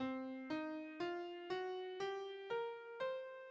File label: blues